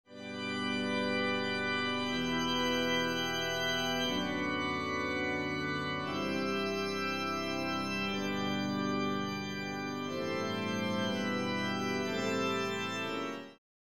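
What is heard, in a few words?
Easter Hymn, Full Orchestra Accompaniment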